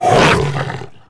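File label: tukata attack; Star Wars Knights Of The Old Republic I; XBOX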